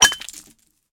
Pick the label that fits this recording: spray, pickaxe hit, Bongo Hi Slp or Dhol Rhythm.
pickaxe hit